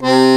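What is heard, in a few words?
bandoneon C2, accordion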